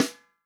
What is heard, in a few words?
FUNK SNARE CHROMATIC, REAL DRUMS